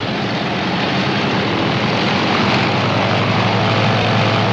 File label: B29 Rev Up Looped